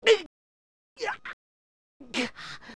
Choke Full; Adult Link

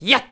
Jump; Niko; zelda sounds

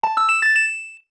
Chest Appears; A treasure chest appears; LTTP

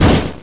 Mine Cart Jump; Jumping into a mine cart

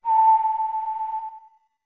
Notes Whistle A long; OOT

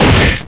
Mine Cart Stop; A mine cart coming to a stop

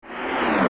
Dizzy; OOT

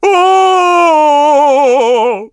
"Ohhhh"; King Tuft: "Ohhhh!!"; zelda

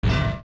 Steps Iron Boots

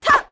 Sword; The Wind Waker; Link swinging a sword

